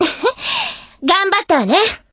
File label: voice huhu; moe pachinko; android game music